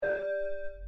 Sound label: concrete